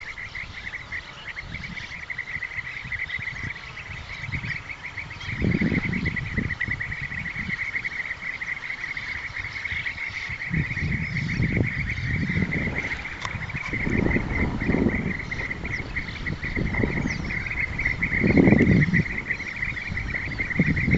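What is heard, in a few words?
European bee-eater voice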